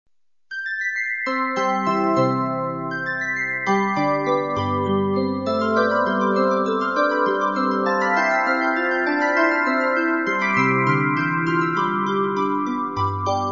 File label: Harp; Handel